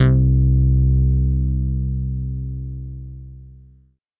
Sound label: Classic Bass C2